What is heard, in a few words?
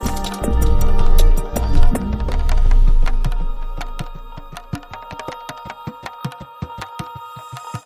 Link Sting